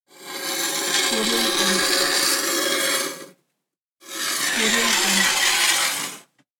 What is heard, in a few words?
metal sliding; sounddivision